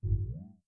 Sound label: Window Shade Down; Oxygen; plasma